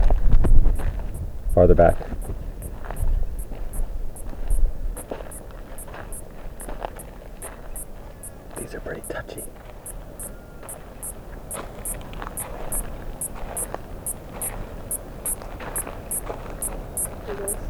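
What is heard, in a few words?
podgy black, Western Australia; WA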